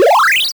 Powerup

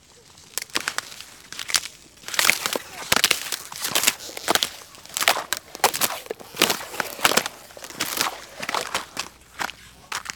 Thin Ice Breaking

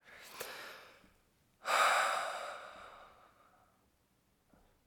Breath out tired

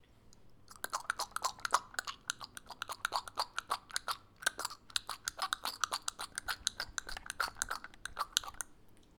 Mouth Noises

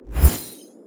Magic Whoosh

